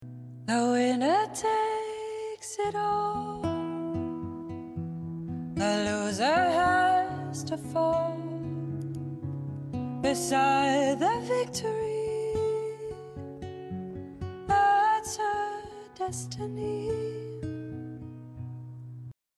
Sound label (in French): voix seule